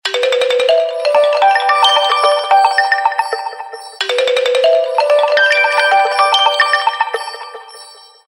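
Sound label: Samsung; SGH-A177; Soft Beems of sunlight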